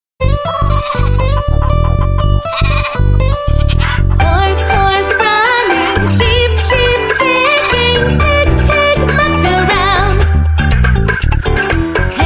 Animal Rhymes

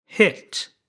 hit

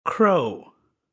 crow

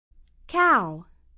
cow

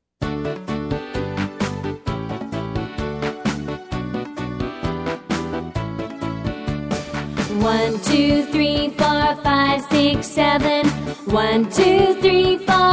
Seven Steps

